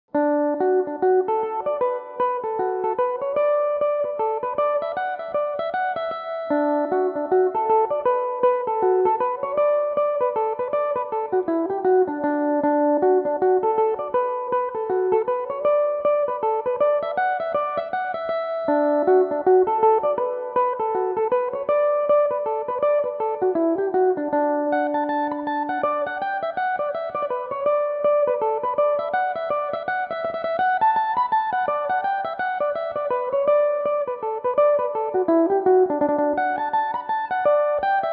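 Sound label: D major, irish mandolin, tunes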